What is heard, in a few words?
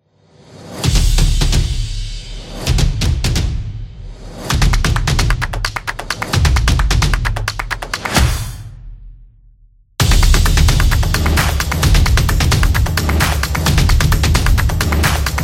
Upbeat Drum Solo